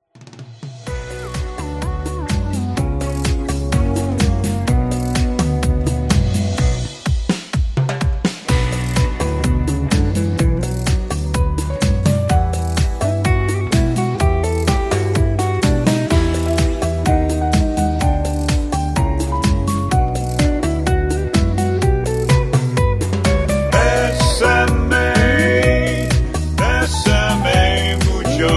Instrumental with Harmony

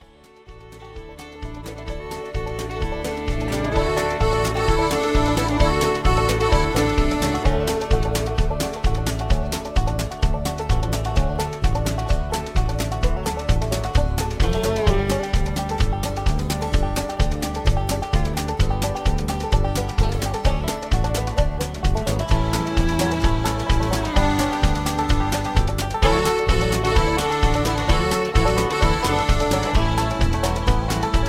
Patter

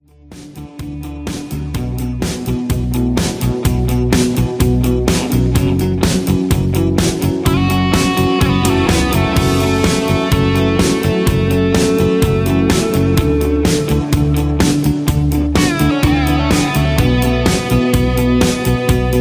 Instrumental with No Leads

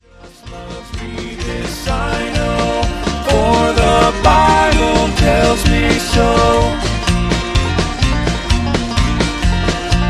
Harmony on All Singing Low; Singing Calls; Gospel

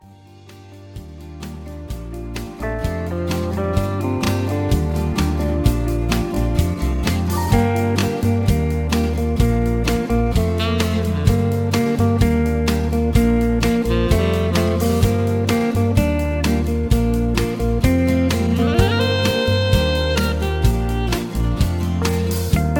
Instrumental High Key with Leads

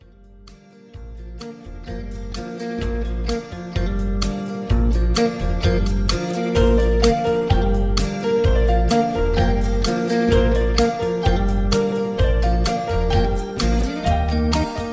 High Key

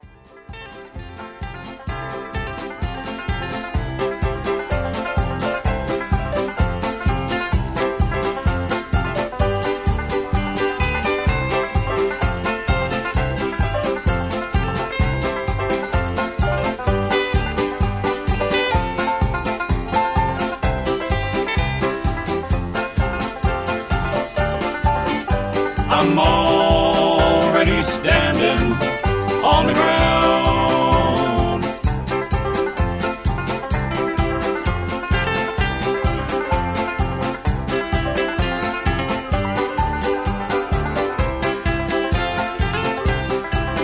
Instrumental with Melody

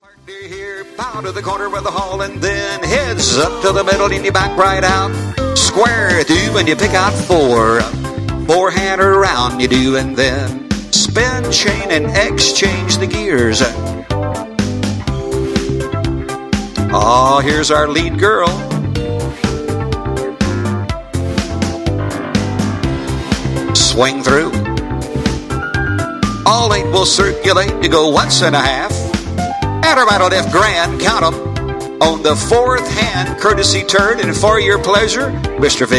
vocal

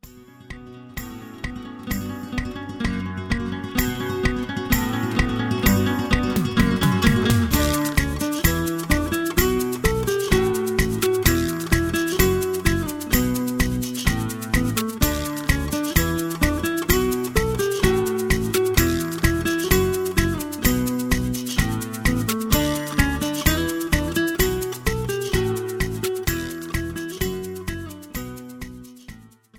Patter

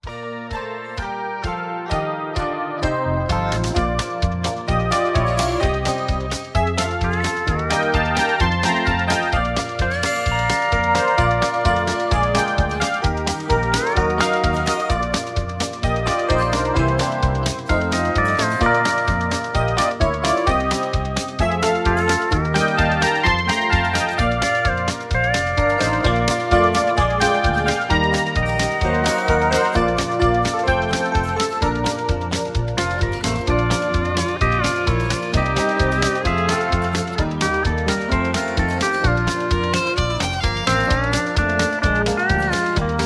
Instrumental

